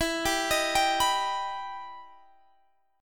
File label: E Minor Major 7th E down Guitar Standard; EmM7 arpeggiated